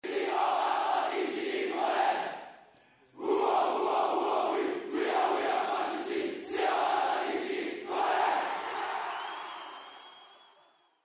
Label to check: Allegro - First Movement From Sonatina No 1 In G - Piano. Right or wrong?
Wrong